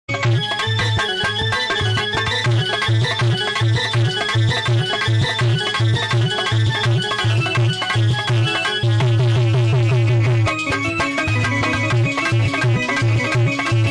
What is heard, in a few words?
banjo band party ringtone